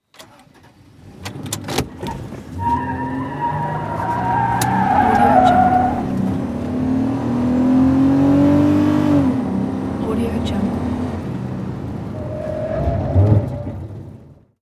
vehicle accelerate quickly peel interior

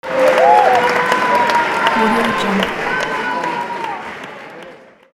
cheering applause

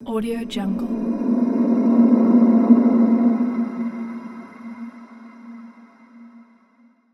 creepy gust of wind

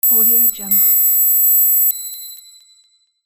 message notification; soundwood

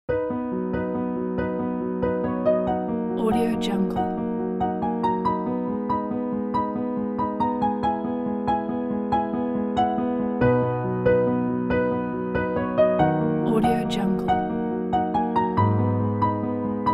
sensitive

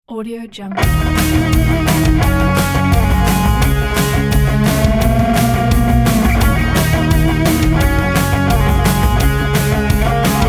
adrenaline pop punk

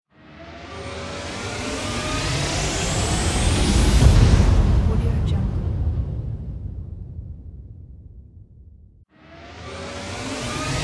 riser with whoosh and hit

